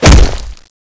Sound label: speargun stone; weapons; cstrike